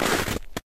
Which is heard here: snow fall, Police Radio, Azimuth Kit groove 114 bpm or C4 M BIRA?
snow fall